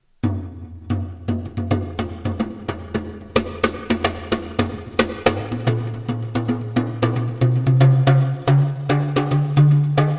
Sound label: 10 inch hand; Variable Pitch TALKING DRUMS